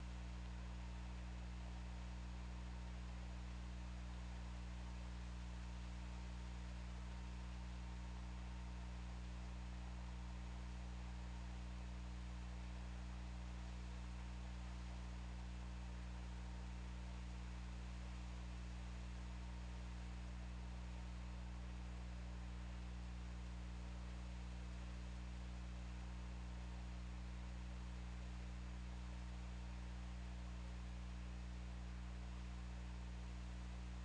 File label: Orca; OrcaLab